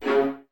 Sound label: C#3, String Ensembles, Violas, Stacc; Miroslav Vitous